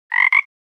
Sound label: Frog croaking